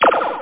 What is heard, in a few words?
lasar enemy; Quasar Phase II